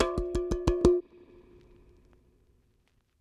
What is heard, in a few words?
bongo; eddyflux